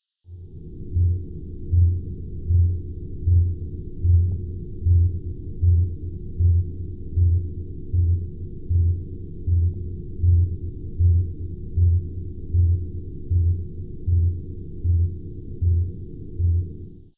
blue A call, blue whale; baleen whales

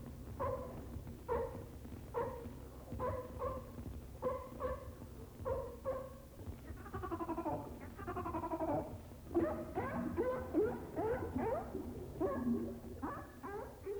California sea Lion, pinnipeds

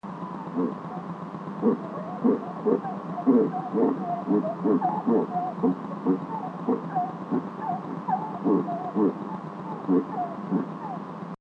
ringed Seal, pinnipeds

